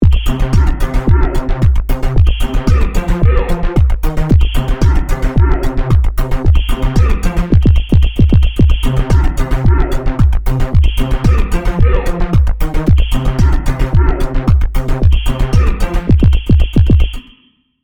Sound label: Camel Loop